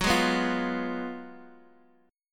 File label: Gb Major 7th Suspended 2nd Suspended 4th Guitar